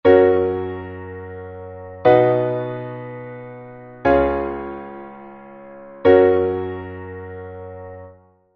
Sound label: F major three chord